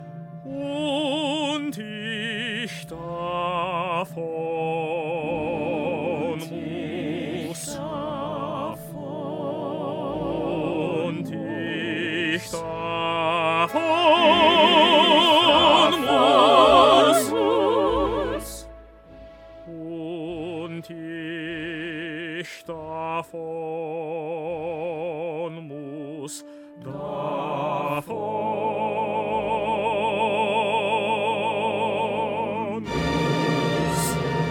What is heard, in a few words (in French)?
Tutti; C–mesures 079 à 094